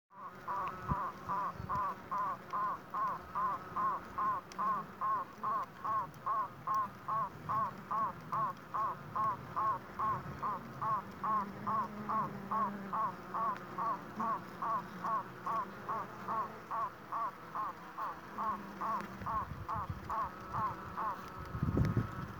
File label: Queen piping in apidea